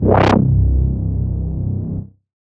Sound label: Bass